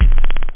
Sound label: bomp, drums, technobd